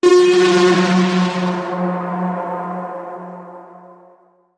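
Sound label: Creature Warbling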